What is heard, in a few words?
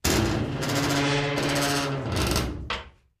Vault Outer Door Open; safes & vaults